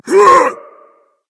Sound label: grunt attack berserk; cuchulainn